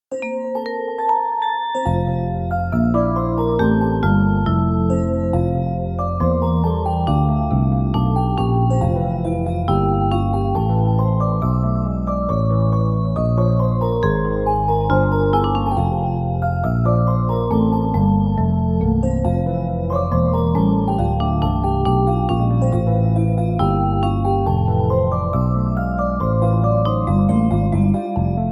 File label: christmas jazz; mixkit